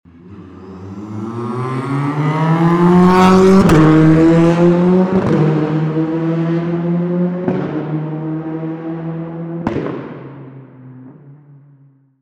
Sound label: TCR Full throttle